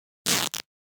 Cloth Split Rip Tear - Short - Foley Cloth Movement; Hollywood Edge; sound designer's toolkit v2